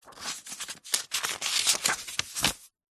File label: household book diary page turn